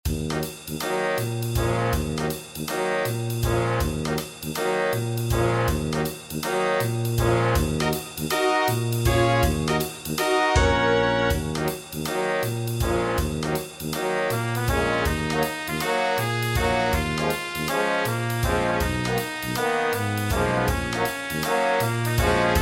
Mashup - Brass Quintet; What Child is This

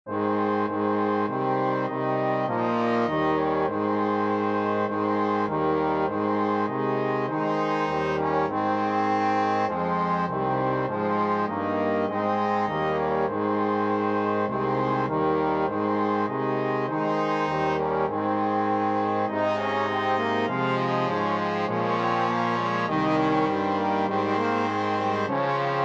Angels Brass; Ангелы воспели песнь - Angels we have heard

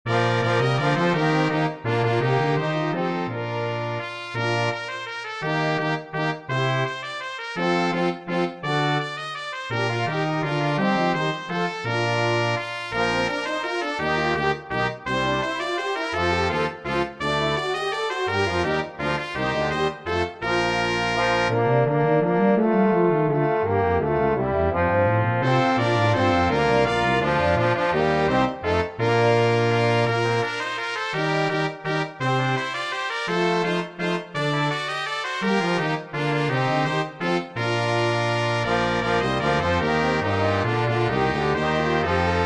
Variations Brass Quintet; We Wish You a Merry Christmas

